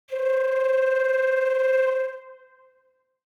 Cartoon funny flute

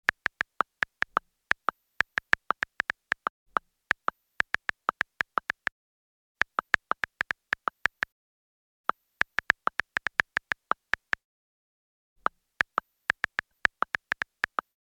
Smartphone texting

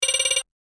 Digital interface short scroll menu ui

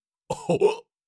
Cartoon lion voice hiccup

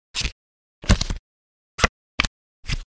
Shuffling and dealing cards

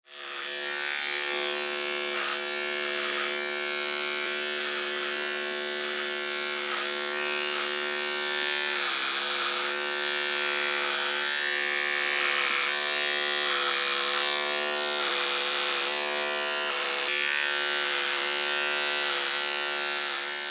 Barber razor haircut electric hair clipper

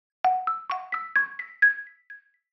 Alert notification delay

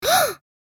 Comic surprise gasp; shorts sound effects

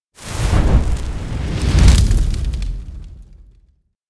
Fire whoosh transition; cinematic

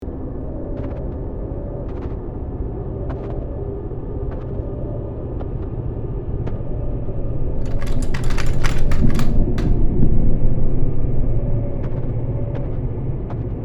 Mountain cabin horror ambience tension loop

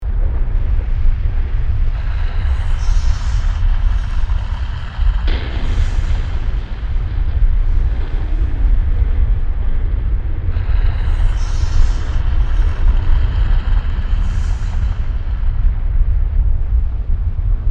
Strong volcanic tremor ambience loop

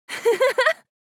Young woman laughing

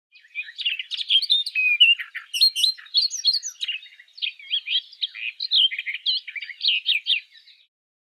Barred warbler chirping

